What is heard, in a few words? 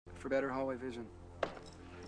hallway